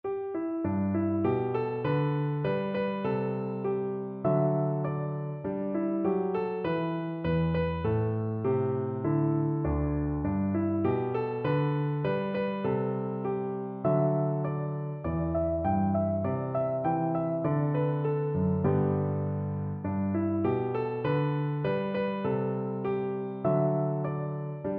piano

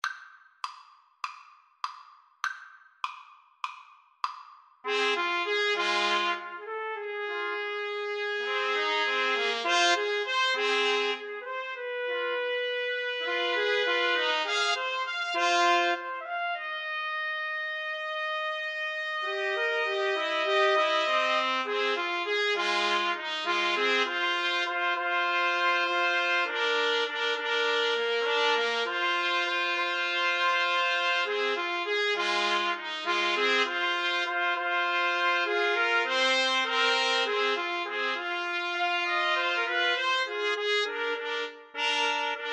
TPT, Trumpet Trio

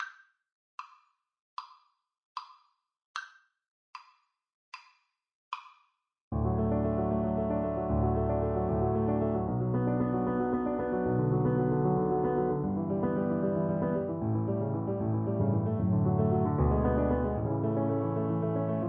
Cello; Schubert; Op90 No3

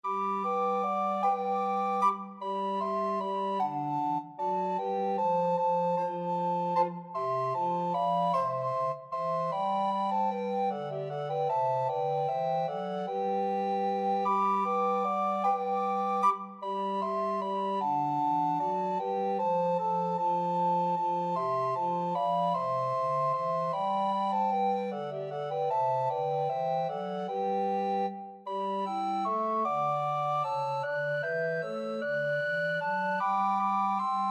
recorder quartet

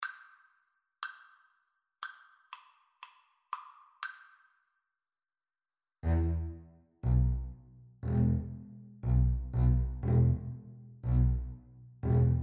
Double Bass Duet

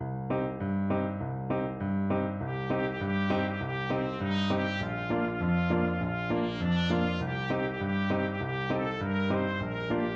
trumpet

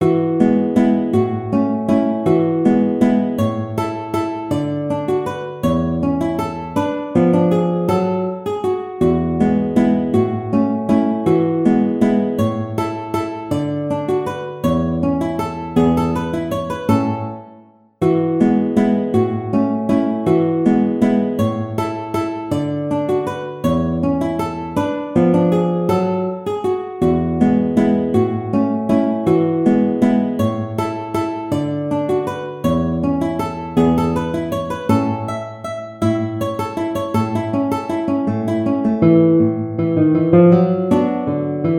Waltz, guitar

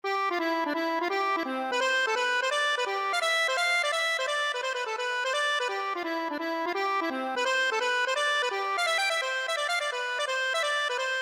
hornpipe, accordion

